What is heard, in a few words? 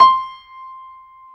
PIA PRO, Roland